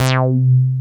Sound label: MONO POLY, BS